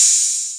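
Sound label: open hi-hat, 808 Basic; DRUM MACHINES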